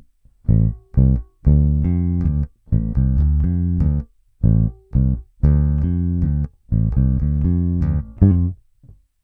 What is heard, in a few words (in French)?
chevalet; schecter extreme 4